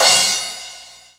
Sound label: Korg N5 Rock Kit C#3